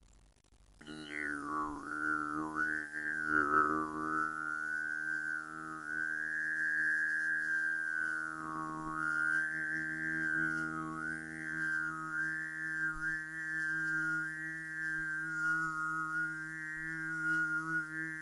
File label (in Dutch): boventoon zingen